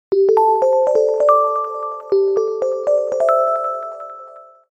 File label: call user